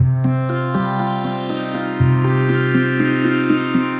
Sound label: Mod Pod, Bells & Tines; Sonic Foundry; The Electronic Music Manuscript Collection